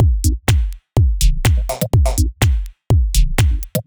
Duck E 124bpm, House Drumloops; Zero-G House Fabrik; 90 s Sample CDs

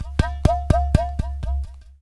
UDU; Spectrasonics; Heart of Africa

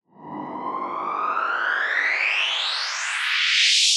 Reverse Fx; musicradar

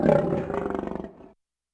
Growl; YAMAHA RM1X

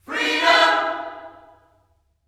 FREEDOM, Vocal Planet Gospel

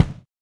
RX8 BD, Yamaha RX-8

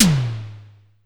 Drum X Tom, Pearl

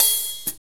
HAT, hi-hats; AMG; Now CD-ROM (Roland); NOW! Drums

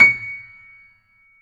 PIANO C#, STEINWAY; Greytsounds; Philip Wolfe David Crozier's Saxophones; GR8 STEINWAY

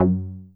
POKE BASS; BASSES

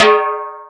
Yamaha CS6, Drums

